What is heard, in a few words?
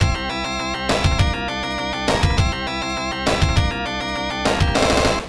Opponent Scores, Victory; Tecmo Cup Football Game; Genesis